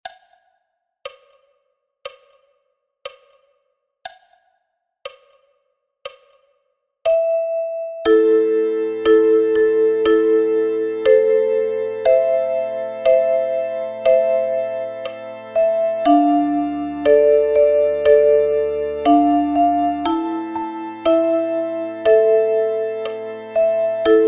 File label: The Wellerman Chrom Sound